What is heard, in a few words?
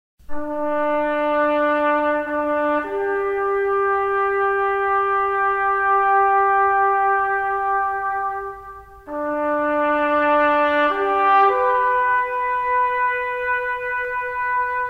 Taps On Trumpet